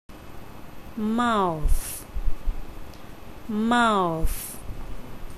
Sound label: mouth